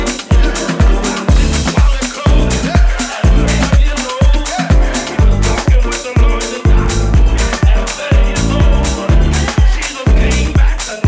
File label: VOC